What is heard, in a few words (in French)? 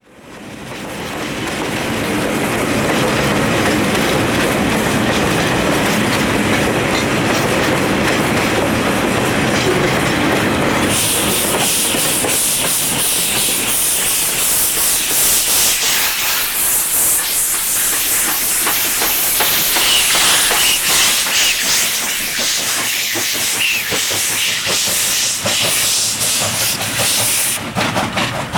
bruit joints de rails; sonotheque